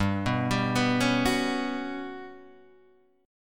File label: G Major Sharp 11th G down Guitar Half Step Down